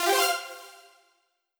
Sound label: button detuned saw up